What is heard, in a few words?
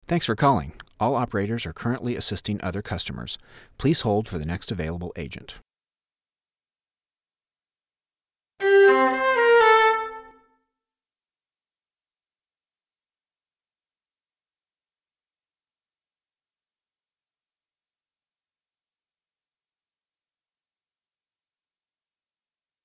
cello